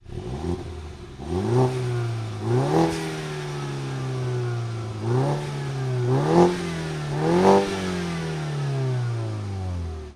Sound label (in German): Mini JCW 2,0l 170kW Serie